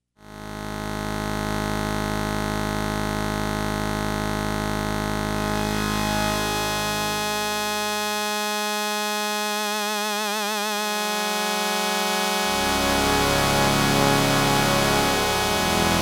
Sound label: Bright Drone